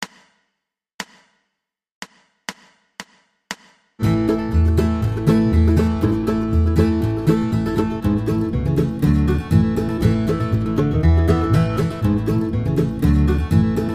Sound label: A 120 bpm, rhythm; Cripple Creek